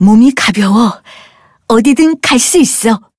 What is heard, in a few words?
levelup; cookie run